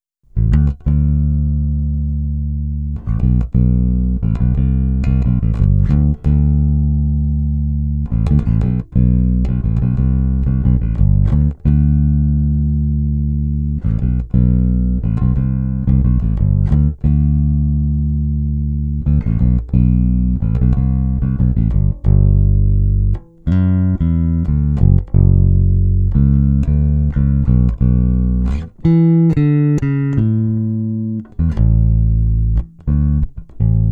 Country Mix, basses

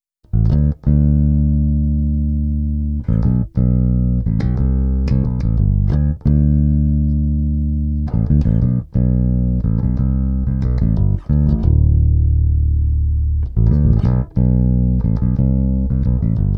Country Mix, basses

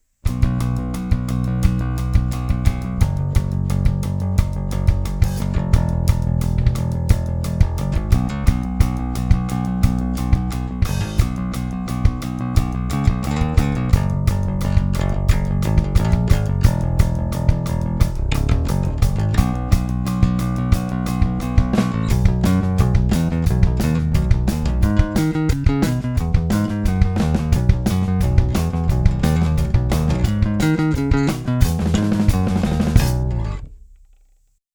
Hybrid 5 Punk Mix, basses

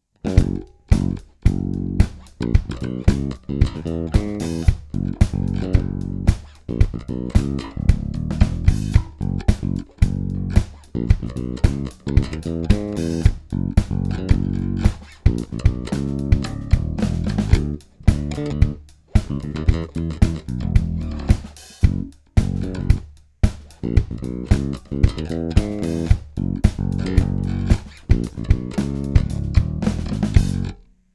Funk Mix, basses; Hybrid 5

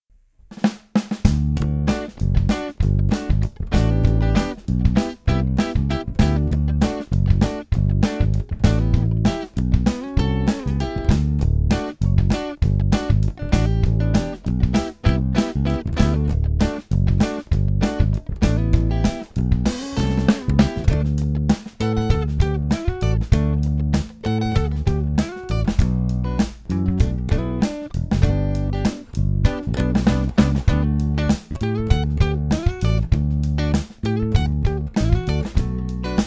Soul Mix, basses; Hybrid 5

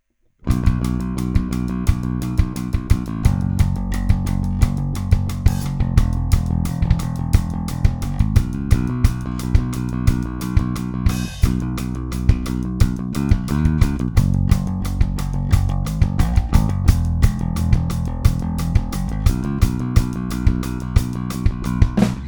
Punk Mix

